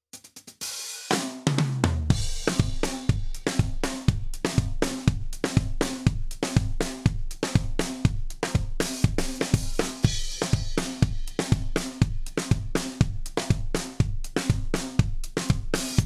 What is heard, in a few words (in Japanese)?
ドラム サウンド ルンバ ビート